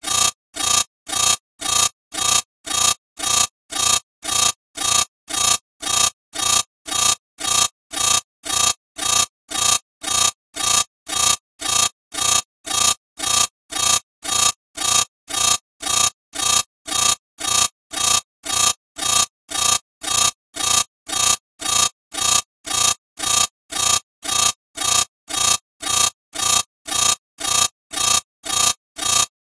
science fiction alarm siren warning harsh buzz medium